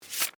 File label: PAGE TURN